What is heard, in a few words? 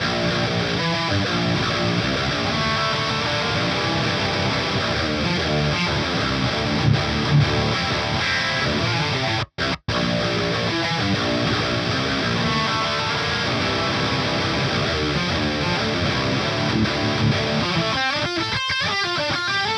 guitar